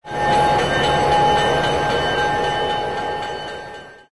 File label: train; Source Engine; tf; mvm warehouse